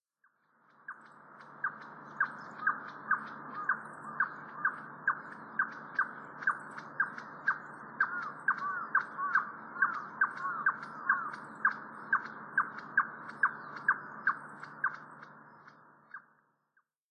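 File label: Ruddy-breasted Crake; hikuina; watarase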